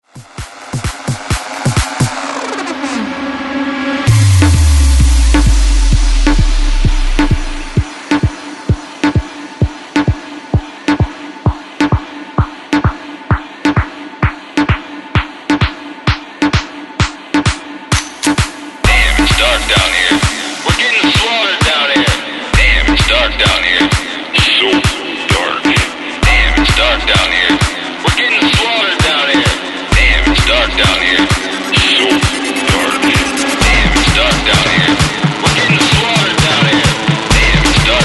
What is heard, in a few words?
130 BREAKMIX; DJDOLLS